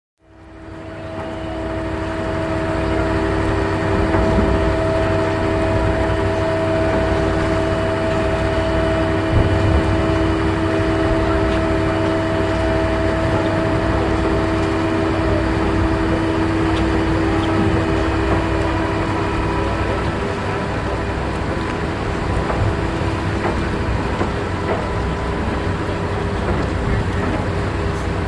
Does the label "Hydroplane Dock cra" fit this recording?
Yes